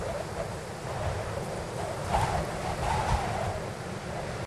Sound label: Wind Gusty Loop; weather fx; Silent Hill Downpour